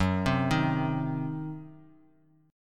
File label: C Diminished F sharp